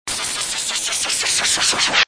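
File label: noise gliss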